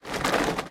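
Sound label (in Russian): короткий звук швейной машинки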